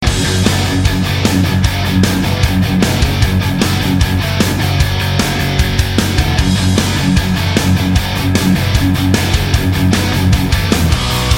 metal ring accept; ringtones; rock

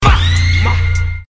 Screech

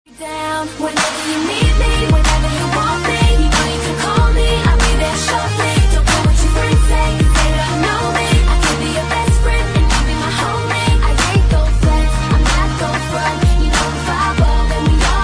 female reply

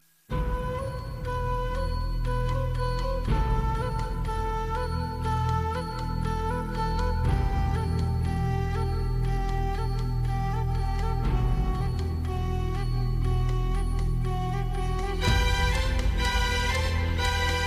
theme song; southland

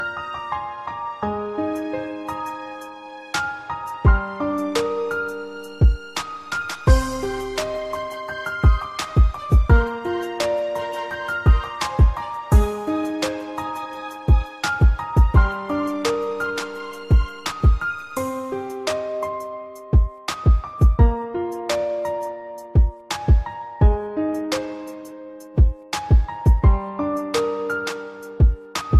BREAK UP TONE, ringtones

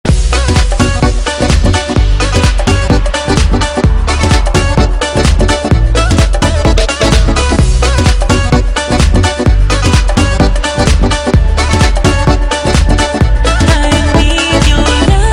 romanian ringtone

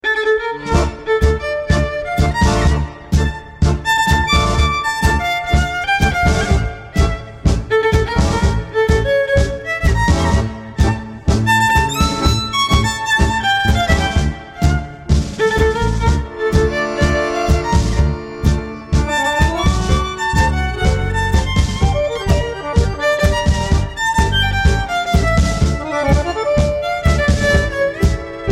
tango, ringtones classical

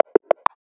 Notification

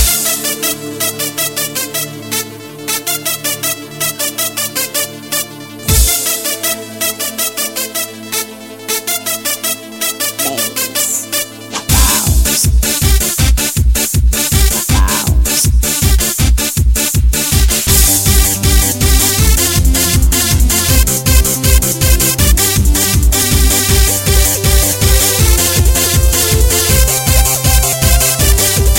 RINGTONE; QFX

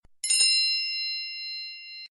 Apple Chimes sms

